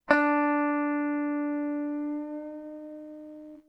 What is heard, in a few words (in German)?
bend, gitarren